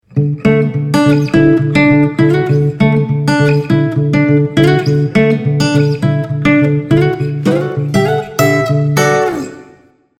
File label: happy acoustic; ROKiT IO-3D; ringtones